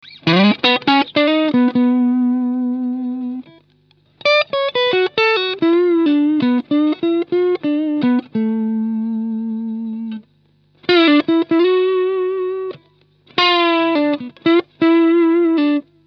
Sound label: Tele Neck PU